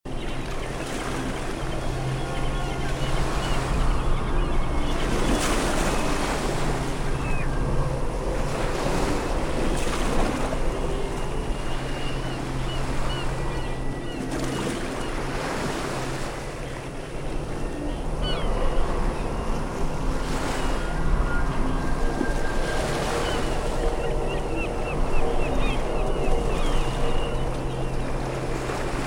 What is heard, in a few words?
(Ambient) The Lost Island; rayman 2 remastered soundtrack